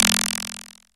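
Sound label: electronic noise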